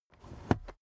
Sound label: car headrest full adjustment